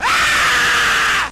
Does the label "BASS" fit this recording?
No